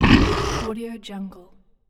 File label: monster